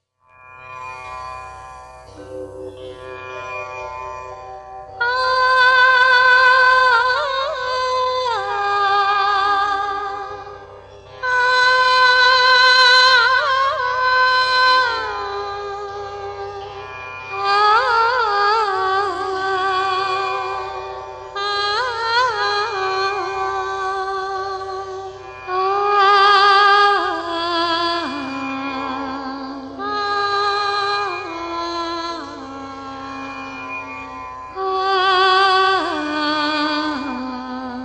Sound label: female